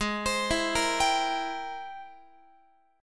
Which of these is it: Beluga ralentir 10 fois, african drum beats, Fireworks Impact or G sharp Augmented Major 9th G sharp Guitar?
G sharp Augmented Major 9th G sharp Guitar